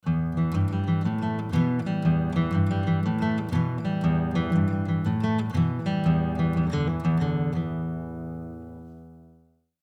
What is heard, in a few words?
Arpeggiating Standard; west african rhythms; ragajunglism